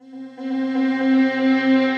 Ambient Strings; Ambient & Evolving

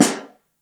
SN Mono, Snare; Ableton Foundation FreePacks; Snare Designer

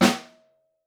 SN1 Room, Snare; Ableton Foundation FreePacks; Snare Designer

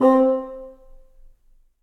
Bassoon Solo Staccato; Ableton; Orchestral Woodwinds

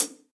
Hihat Multirod Closed Dry Acoustic; Drum Hits; Standard Hits; Ableton; Factory Packs; Session Drums Studio

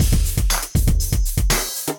Acoustified Kit, Drums; Ableton; Bomblastic; Acoustified Kits

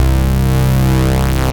Rezzo Collider, Bass; Basses; Ableton; Creative Extensions

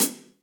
Hihat Closed Ambient Loud Punch; Drum Hits; Standard Hits; Ableton; Factory Packs; Session Drums Studio

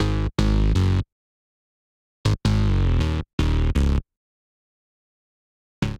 Modality Bass G# Minor 80 bpm; MIDI Clips; Tonal; Bassline; Ableton; Factory Packs; Chop and Swing

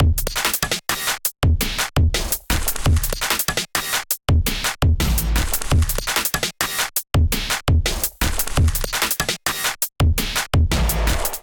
Kit groove 84 bpm; Resurrection Kit groove 01 84 bpm; Ableton; Factory Packs; Drum Essentials

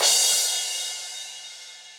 Crash 16 Steely Tight, Cymbal; Drums; Drum Hits; Standard Hits; Session Drums Studio

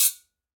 Guiro C Down; Latin Percussion; Drum Hits; Wood; Ableton; Factory Packs

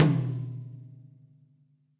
Tom Mid Ambient Loud Punch; Drums; Drum Hits; Ableton; Session Drums Studio; MPE

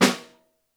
SN Room, Snare

